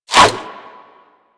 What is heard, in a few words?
Sword And Whip Whoosh; Warframe